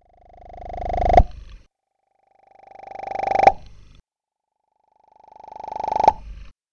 pops

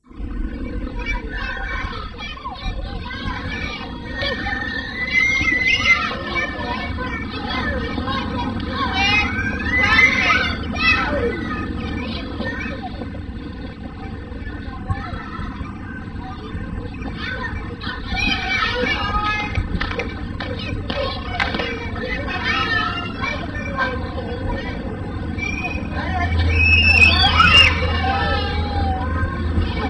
kids outside ambience

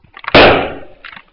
big pop

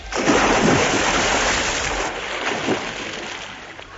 splash; bbc

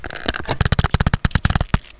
more strain

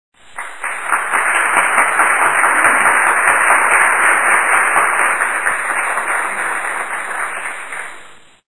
crowd applause

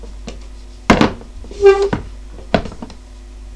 chair

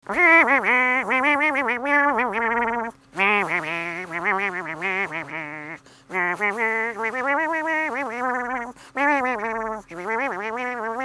silly nocturne

